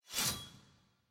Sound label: lobby build in challenger; regalia; challenger split 3